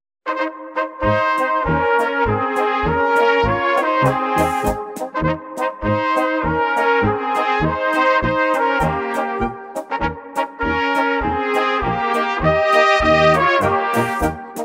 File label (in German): Musikantenpolka